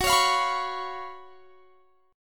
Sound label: Gb Major 7th Suspended 4th Gb, guitar